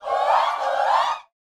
SHOUTS, Gospel

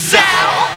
"zow", vocal

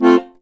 HOHNER F, akkordeon; Best Service; Alpine Volksmusik

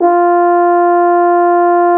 FR HORN C4, French horns; Greytsounds VOL-1

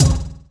Trap Kick; Sonic Foundry (Sony Creative Software); Esoterik Beatz; Trap Kit

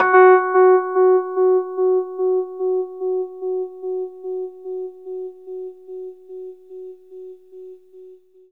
RHODES OCTAVE F#; 3D Sound; 3D Keys VOL-1

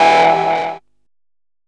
DR. LOOP, TRANCE; X-Static Goldmine 3

